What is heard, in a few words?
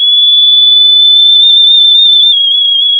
pop, sound of a tea kettle whistling